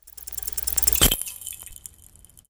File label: dice roll fail